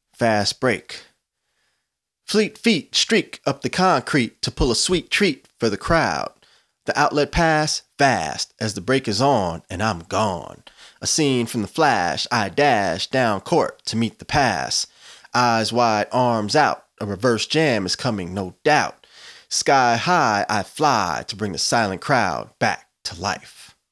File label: Rimshots Fast Break